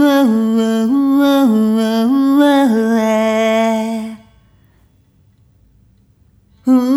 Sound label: Rhythmic A